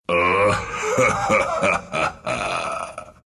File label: Wraith laugh ru